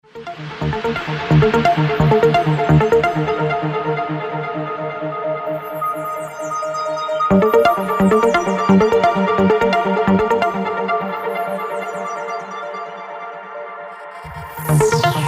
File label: ringtone